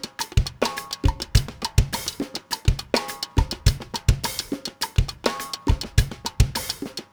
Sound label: drum orig, drum pattern 3; Separation of Sound Sources by Convolutive